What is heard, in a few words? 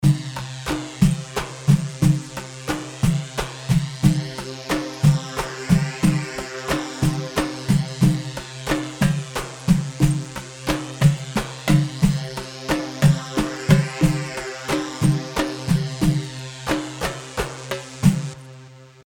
Samery 3/4 90, khaleeji